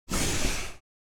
Cardboard box sliding close perspective

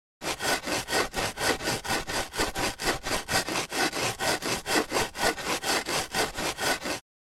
Digging in sand with a metal shovel fast

